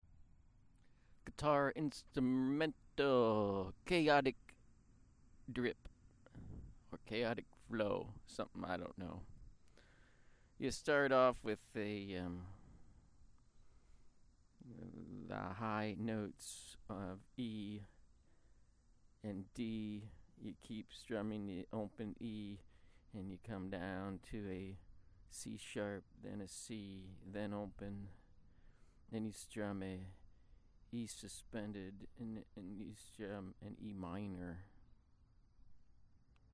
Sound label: Chaotic Drip Notes